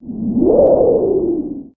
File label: wind; Monster girl quest Part1,2,3; Visual Novels